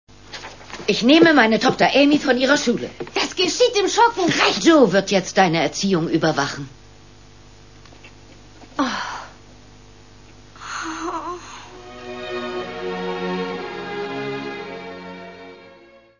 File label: sigh